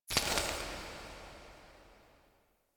Tarot UI Emotion Click; Warframe; Harrow; Tarot Emotion Choice

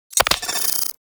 Rifle Alt Mech, weapons; Gyre

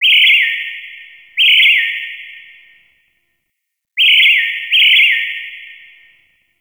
Terrarium Bird Chirp D; Warframe; Ambience; Entrati; IntTerrarium

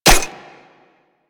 Alchemist Vial Ability Orb Spawn; Warframe; DE; Warframes

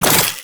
Scrap Pickup

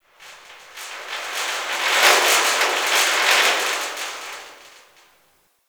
Gas City Rattle Distant Metal; Ambience; Warframe; Corpus Gas City

